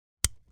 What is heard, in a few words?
lamp on